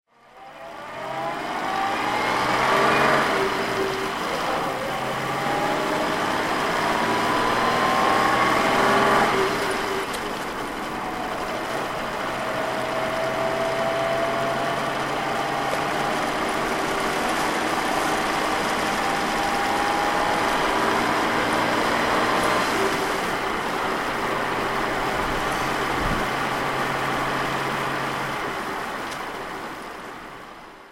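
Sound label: jaguar mk4 dhc driving, engine sound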